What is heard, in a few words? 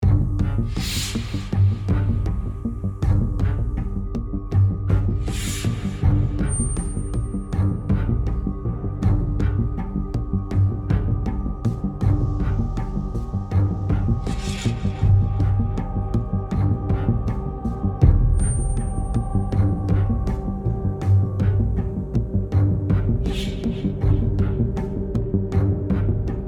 Tense loop